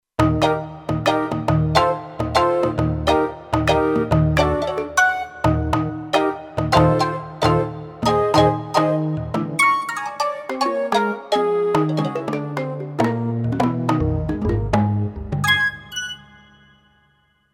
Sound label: Perc Stack, Kurzweil K2500X